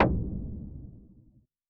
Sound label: perc